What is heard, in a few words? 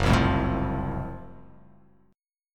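Gb Minor Major 7th Flat 5th Gb, 8 string; strummed; 2,3,3,2,5,x,x,x; JGuitar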